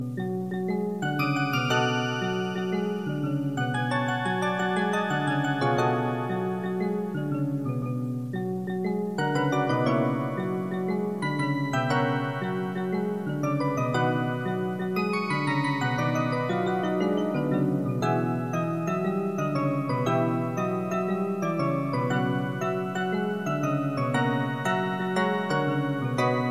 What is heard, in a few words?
Jazzy